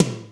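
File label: processed tom, drums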